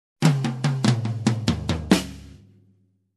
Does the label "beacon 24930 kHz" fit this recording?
No